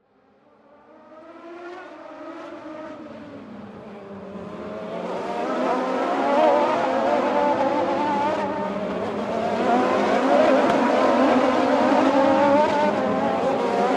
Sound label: Grand Prix Race, Sports, Grand, Prix, Race, Cars, Pass, By